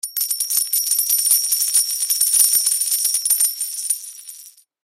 Coins Drop Multiple, Coins Drop Multiple Pirate Gold; Coins Drop Multiple Pirate Gold Doubloon Concrete Surface; Money; Foley; Blastwave